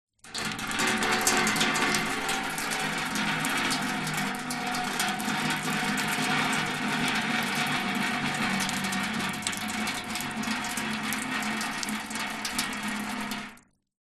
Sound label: nature water pour trickle onto metal tray